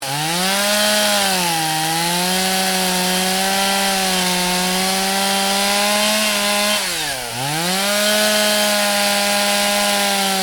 Chainsaw: petrol chainsaw cutting at high revs; Machinery

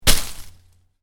Foliage Impact, Hard Foliage Hit; Miscellaneous Impact; Stuart Duffield

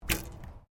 ARCHERY BEGINERS BOW FIRING; Various archery sounds; Miscellaneous Sports

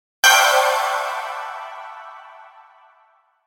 Hit Shimmer Airy Reverb; Blastwave

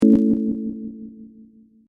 TONE ECHOE, User interface feedback sounds; Tone Sound Effects; Tones (Sound Effects:Sound Design)

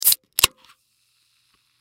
Soda Can Open, Soda Can Full Open Fizz; Foley; Blastwave

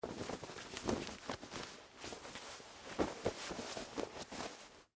Jeans Fold, Foley, Clothes; Dressing; Human; BlastWave

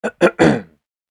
Man Clearing Throat; Clearing Throat Sound Effects; human sound effect

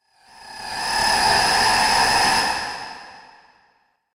Glitch Reverbed; Glitch Sound Effects; sound design

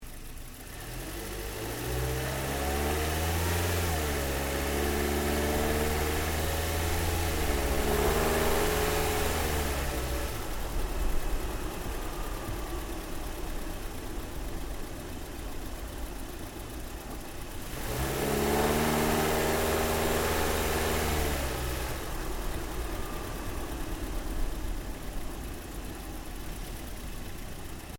Car Toyota Yaris Engine Drive Slowly; Toyota hire car; Cars (Sound Effects:Vehicles)

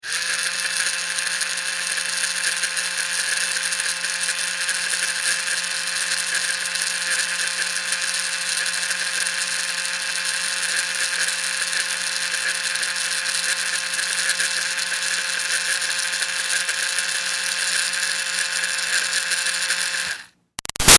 Toy Fairy Wand CU Run Long Steady; Miscellaneous Toy; Toys; Big Room Sound

